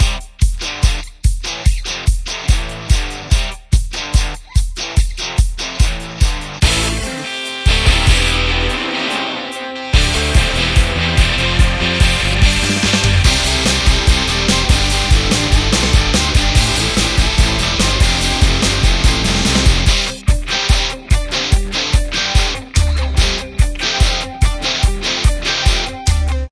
Run; Samsung Galaxy-S8; StoryEditor Dream N

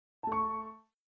Fold open, Nokia N76

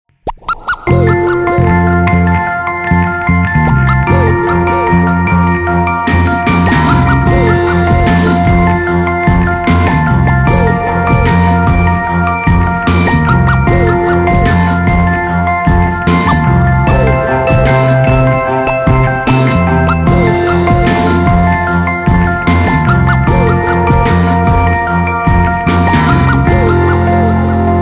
Nokia Tones; Windows Phone apps

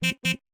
Car Horn, notification; Samsung Galaxy S26 Ultra; Fun

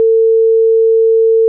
Ringback 450Hz 1500ms; Nokia; Lumia 1320 Southeast Asia

